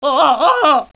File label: pain; svencoop